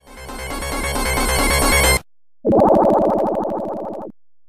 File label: music drowning; sonic 1